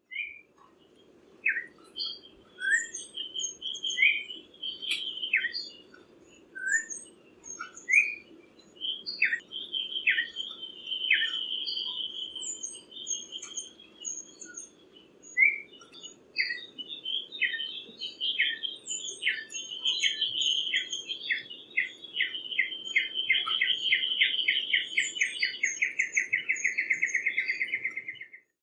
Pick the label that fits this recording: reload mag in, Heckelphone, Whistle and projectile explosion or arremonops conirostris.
arremonops conirostris